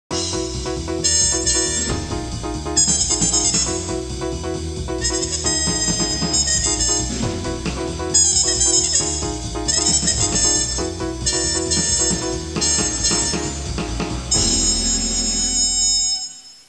suonerie musica jazz

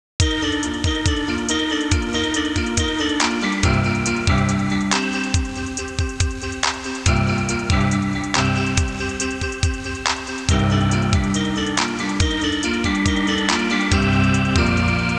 musica newage

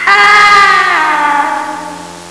suonerie persone urlare

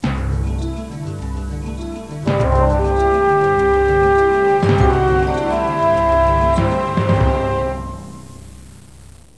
suonerie classica; musica classical

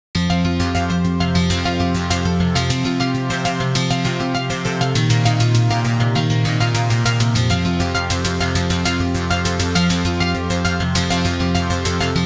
musica elettronica

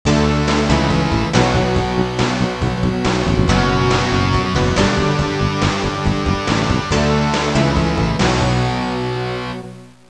suonerie musica rock

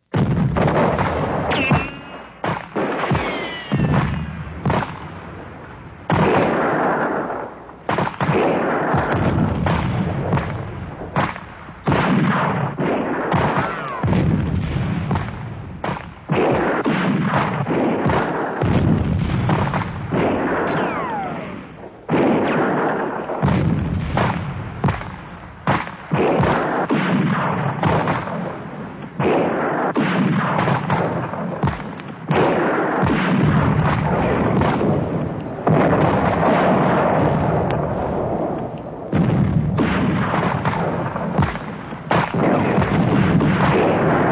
armi

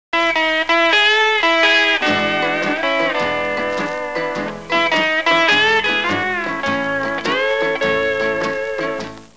musica strumentale; instrumental

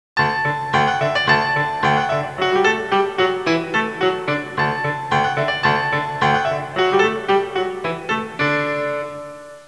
suonerie classica; musica classical

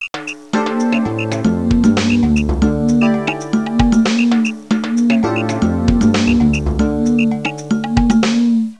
suonerie contemporanea; musica contemporary

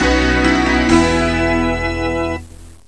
suonerie musica rock

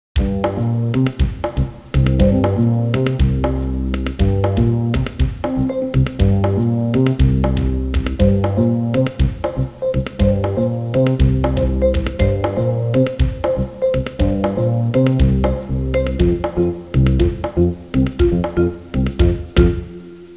suonerie musica jazz

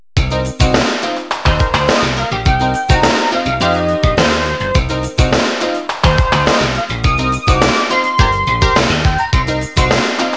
suonerie businessy

